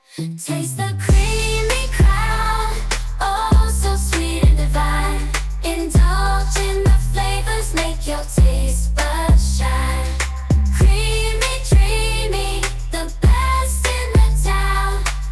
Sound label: jingle; creamy crown